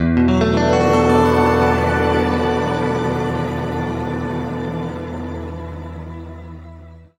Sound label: E P-S, guitar chords; East West; Steve Stevens Guitar Samples Collection